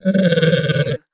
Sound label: Alien Vocalization; sound effects bible